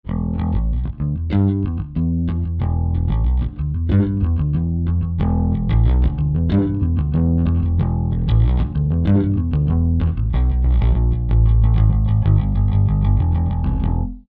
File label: bass glow